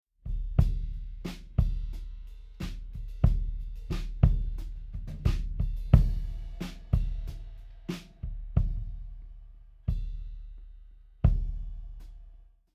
kick sans

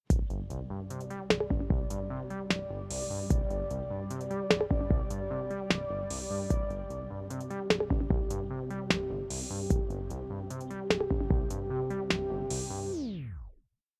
reels it 375 harsh 65; audiothing

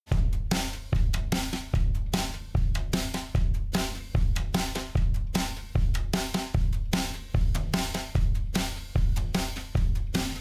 drums vca; infinistrip

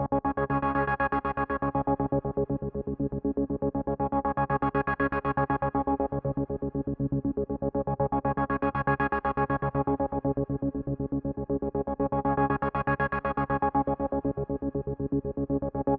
pad gated; auburn sound renegate

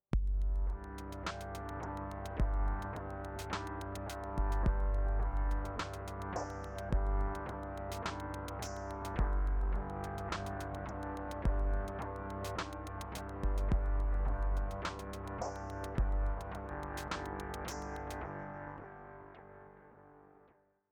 chip

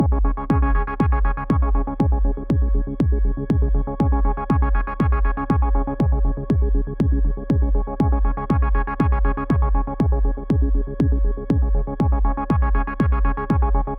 pad fullsong; auburn sound renegate

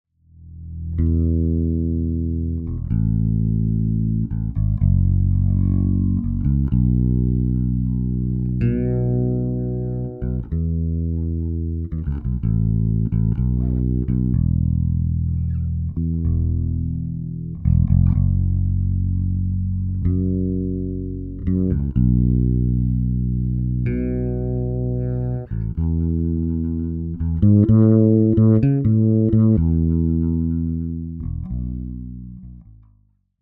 bass without

